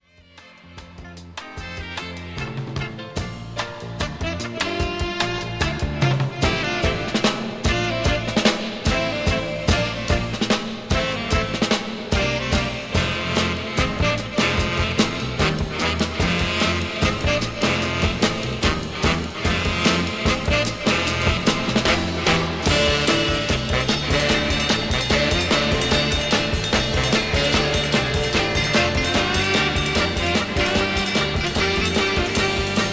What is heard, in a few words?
soul drums